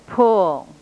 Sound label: vowel pull